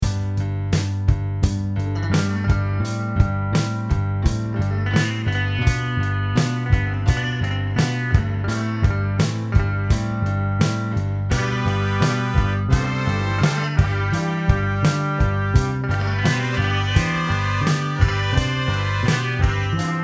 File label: song